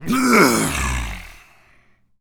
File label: Pain, Zombies; zps; Carrier